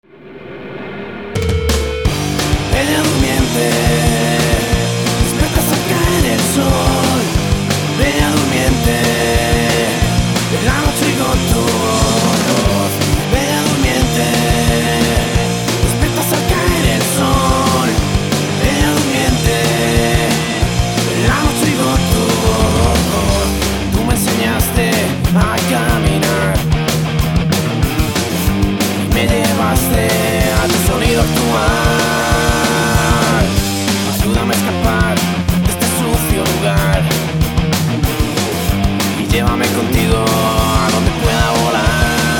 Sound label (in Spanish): sintonia; belladurmiente